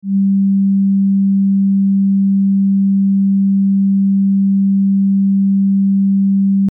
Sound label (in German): sinus; harmonie